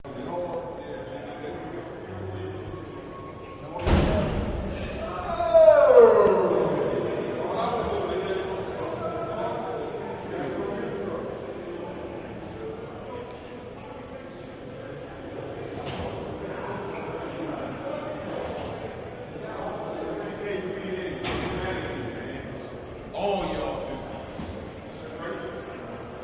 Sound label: Jail Door Slam Keys; jail & prison doors